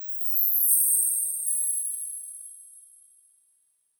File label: fairy dust; soundscrate